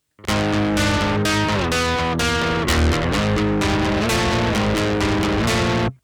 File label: JCM900 Riff; Reverend